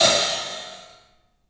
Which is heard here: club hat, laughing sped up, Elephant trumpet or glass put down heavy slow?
club hat